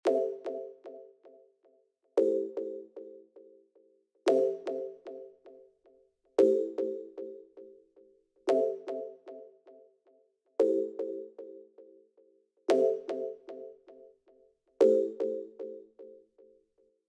preamp

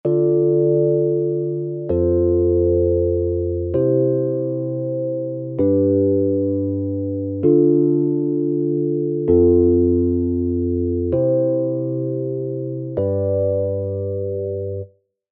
voicing

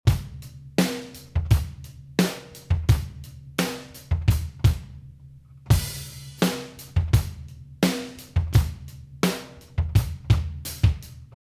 drums before

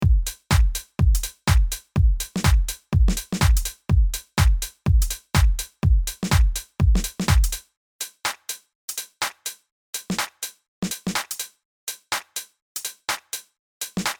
Dreams Drums

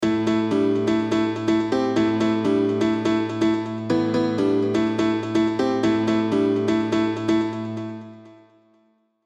pedal tone

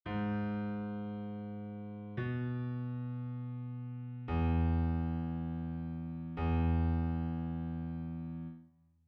Bass fixed

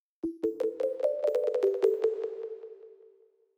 dirty

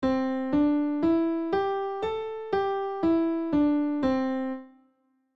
Pentatonic Scale

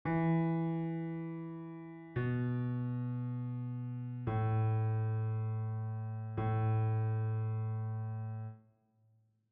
Bass

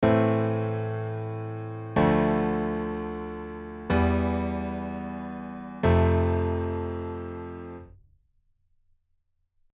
deceptive cadence

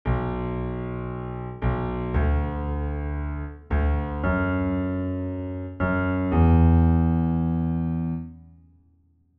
chorus bass

